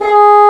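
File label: Bassoon mf G#2; Digital Sound Factory Virtuoso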